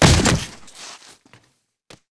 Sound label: die; JokerTrumpy